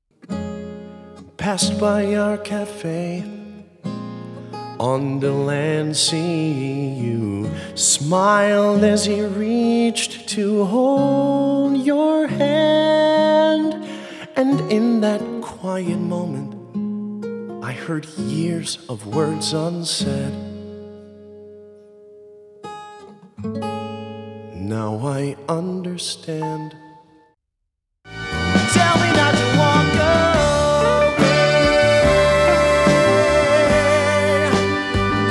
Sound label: Singing